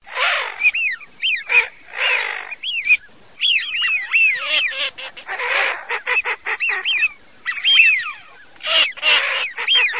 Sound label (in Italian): alzavola fischione, birdsound